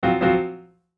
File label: Multimedia Musical Piano Alert Chord Stabs; Miscellaneous Musical Sound Effects